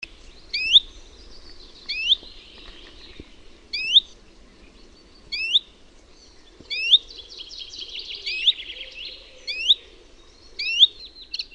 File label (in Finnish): TILTALTTI; OMAT LINTUAANITYKSET